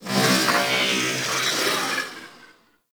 Ship Metallic Robo Chatter; Warframe; Corpus Ship Remaster

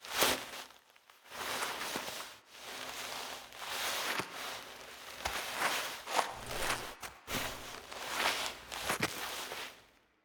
Bone Pit Foley Get Up; Warframe; The War Within; Cinematics

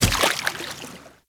step water, Kubrow, Footsteps; Warframe

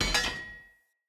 Shield Drop; Weapons; Enemy; Shields; Sinecure Shield

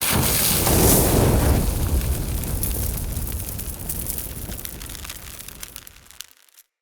Fire Hazard Object Ignite Sizzle D; Undercity

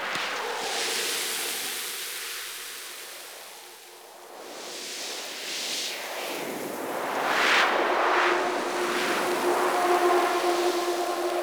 Landscape Wind Medium Loop

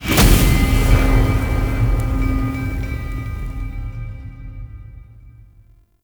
Cabin Choice Stinger; Cinematics; Zariman

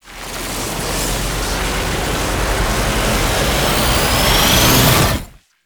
Wrist Gun Alt Charge Up; Weapons; Warframe; Tenno

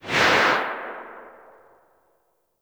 Arch Wing Atmosphere Flyby Right; Vehicles; Movement

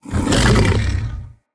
Vocal Attack Short, Hunting Critters; Characters; Predasite

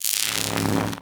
Arc Rifle Fire Random; Infested

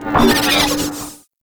Moa Investigate B, Vocals

